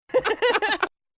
Gay Laugh; BaD Radio

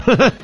machine gun laugh

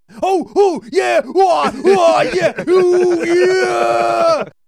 grunt

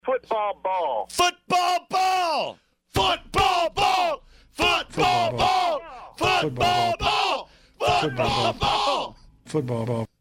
Football Chant; Orphanage

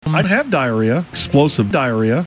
explosive diarrhea; george dunham

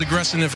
aggress sniff

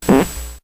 fart

